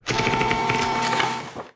naval result alert; Hearts of Iron IV Death or Dishonor

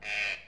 duck; Hearts of Iron IV Death or Dishonor

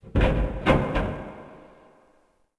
Goodyhut Metal; Ambient; Sid Meier's Civilization 4 Complete; Beyond the Sword

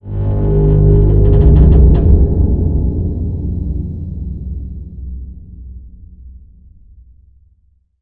rand bridge groan; Left 4 Dead 2; random amb sounds

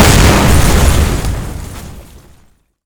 explode, hegrenade; weapons

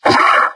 pain, metropolice; Garry's Mod; hl2

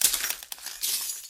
footsteps glass; materials; Garry's Mod; hl2

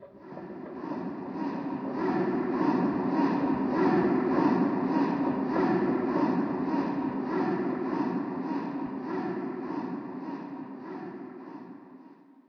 city chant; Garry's Mod; hl2; streetwar

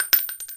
shell, player; Garry's Mod; hl2

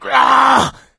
choke; Left 4 Dead 2; coach

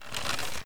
pull bow; Mount&Blade Warband

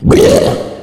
male boomer warning, voice; Left 4 Dead 2

